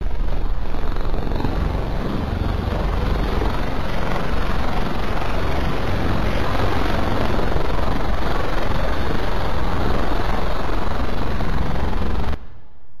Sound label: Signal